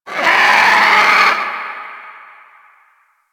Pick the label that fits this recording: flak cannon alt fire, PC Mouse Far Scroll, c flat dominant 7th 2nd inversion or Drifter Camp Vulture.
Drifter Camp Vulture